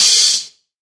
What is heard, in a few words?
thin open hat sample d key, Royality free open hat sample tuned to the D note